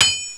anvil

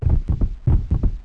ele flup

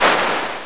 PUFF, HELICOP

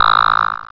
buzz high; yoda

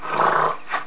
horse

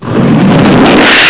RLAUNCH; OS/2 desktop

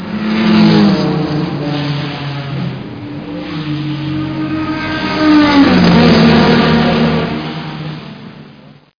racing cars passing by

